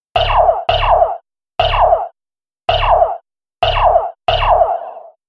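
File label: Sci-fi Game 6 More Laser Shots; Laser Gun Sound Effects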